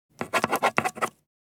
Ballpoint pen writing on paper

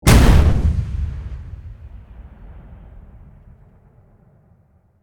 Cannon fire single shot; artillery; gfxsounds

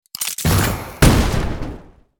Anti personnel mine detonating explosion

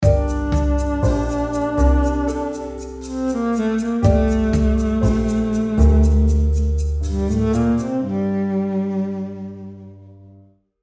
Mystery situation light suspense scene sitcom

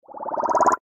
Infographic data chart expand

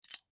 Wear armor

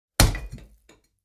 Building blocks dropped on a heavy rock

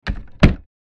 Vintage door closing; household

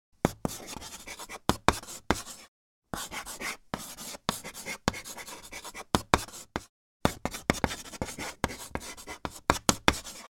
Writing with chalk on a chalkboard

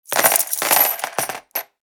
Coin tray cascade slot machine casino

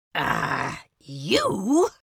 Witch fantasy vocalization short shout

